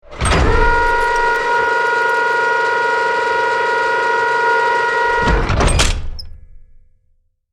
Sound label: Tank turret moving turning mechanical noise; artillery